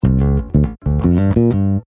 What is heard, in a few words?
Bass Solo; rocktopus